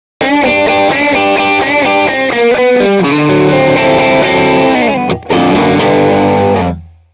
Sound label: Tone Driver ibanez ts808